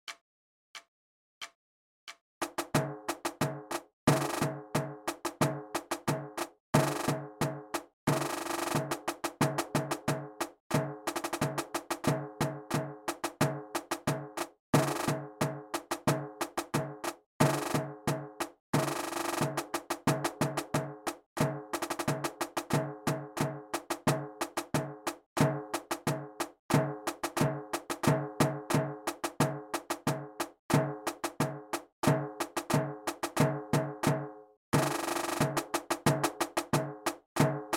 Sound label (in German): Rhythmus; Yorkscher Marsch